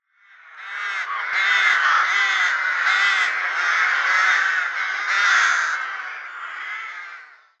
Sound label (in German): Saatkraehe Corvus frugilegus, Vogelstimmen